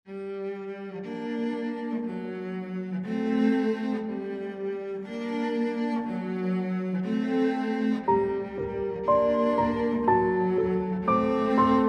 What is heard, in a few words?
Close Mic